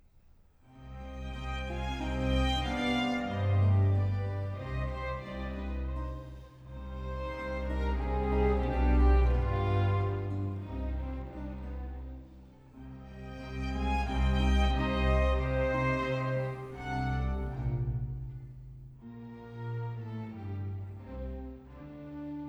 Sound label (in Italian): Sinfonia (Concerto in Do maggiore per archi e cembalo) - Andante; Antonio Vivaldi (1678 - 1741); Vivaldi - Orlando furioso (2004)